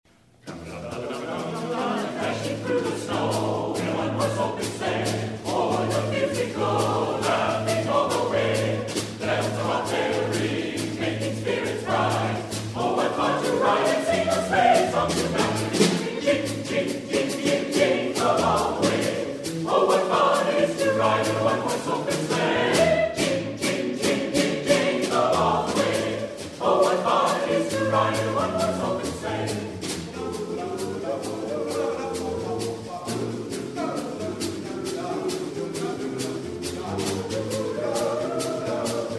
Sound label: Swingle Bells